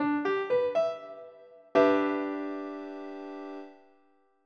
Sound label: EM7